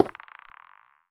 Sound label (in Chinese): 磁石放置声